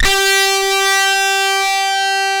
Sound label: rock G3, rock guitar; InVision Interactive; Lightware VOL-1; Instruments & Percussions